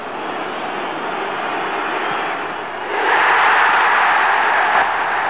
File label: CHEER